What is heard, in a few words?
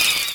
Fan Chant; Mario Paint